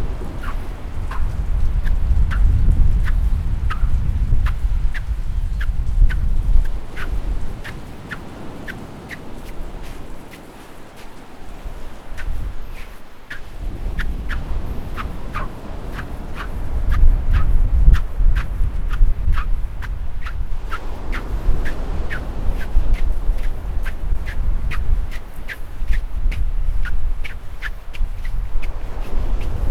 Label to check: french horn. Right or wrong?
Wrong